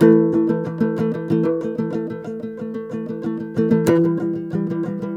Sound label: TOPSHUR; Soundscan vol.60; Asian Traditions; AKAI